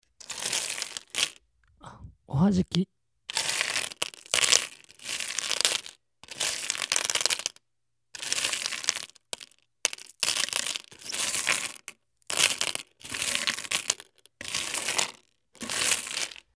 ohajiki

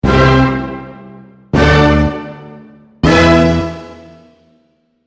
jingle janjanjan

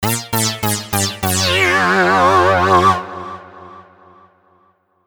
JINGLE pity

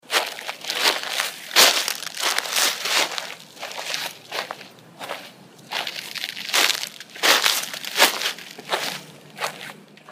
footstep leaves

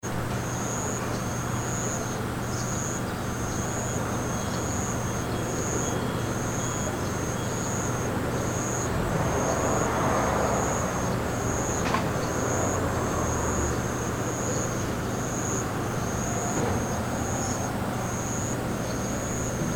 fishing villages

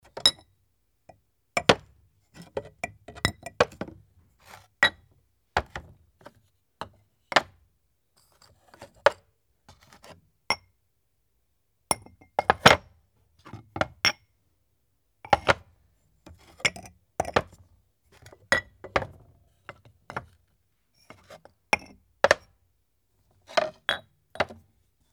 teacup

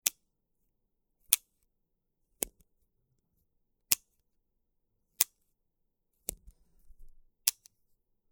ballpoint pen

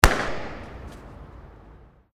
burst

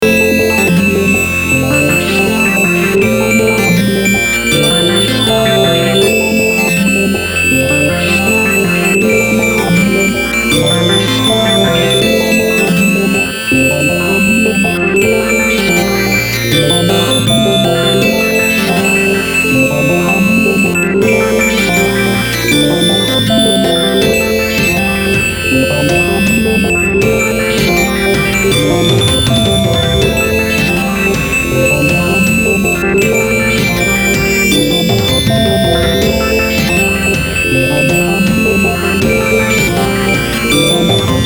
synth texture

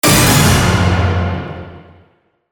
orch hit

